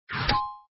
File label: button option; mahjong ningxia